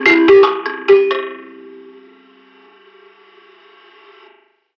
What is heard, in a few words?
computer login